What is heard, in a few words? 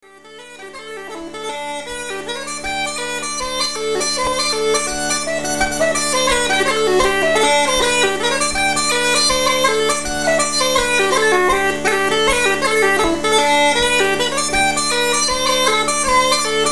hornpipe, piper